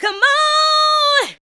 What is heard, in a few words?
ADLIBS 90; Ueberschall; Jam Box